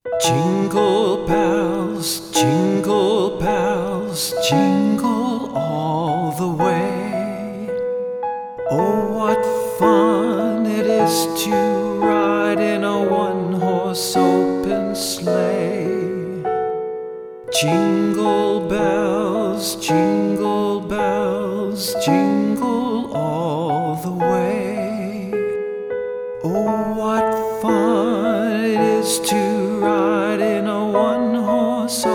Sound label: Jingle Bells Male